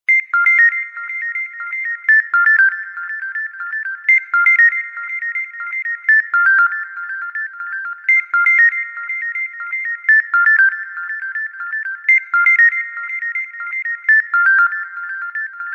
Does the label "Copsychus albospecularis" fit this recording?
No